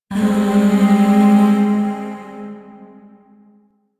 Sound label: Shawzin Phrygian Chord K; Zariman Void Shawzin